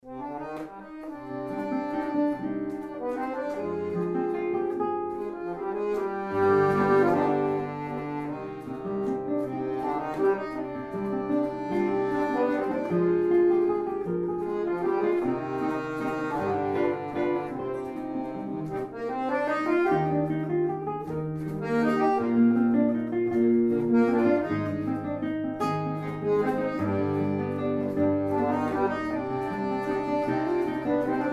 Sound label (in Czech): akordeon kytara